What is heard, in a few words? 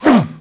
SWORD 3; Thief